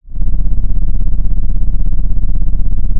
pop, Sound of an engine revving

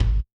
Kick, drum

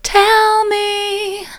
tell me soft, vocal

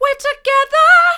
mid, vocal

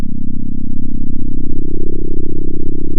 center, sound of a small motorcycle

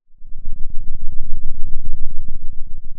Sound of a thunderstorm, center

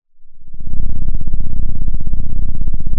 Sound of an engine revving

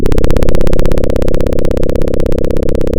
Sound of a plane taking off

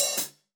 Hats Open, drum

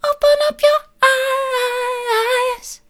open up your eyes soft, vocal

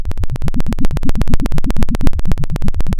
Sound of a helicopter

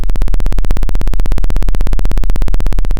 Sound of a plane taking off

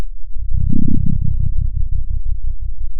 pop, Sound of a dinosaur

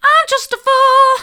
i'm just a fool soft, vocal

